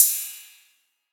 crash cymbal f sharp key, Royality free crash one shot tuned to the F# note